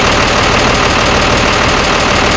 engine idle, tank

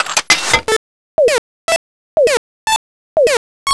timer; tfc; timerbag

